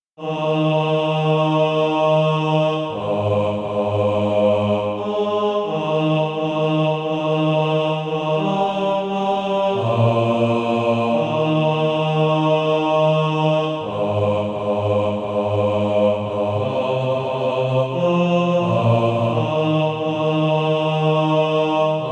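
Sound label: bass, Practise Music; Deep River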